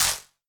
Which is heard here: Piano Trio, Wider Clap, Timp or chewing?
Wider Clap